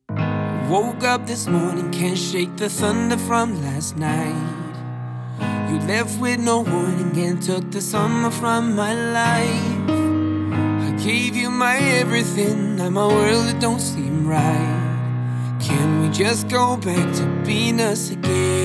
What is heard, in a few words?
acoustic version